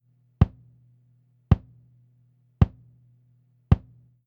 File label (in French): boxiness effet carton grosse caisse